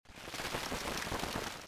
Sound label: money